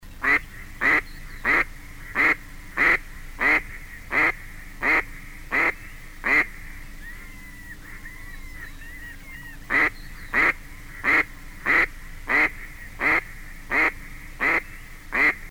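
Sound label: mallard decoy; Подсадная; Краткие сведения об основных охотничьих животных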